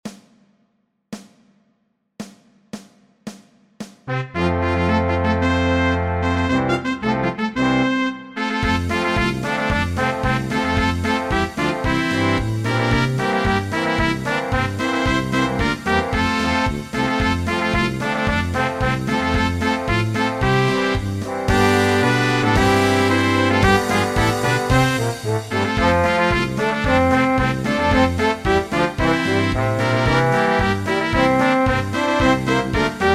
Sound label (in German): rainermarsch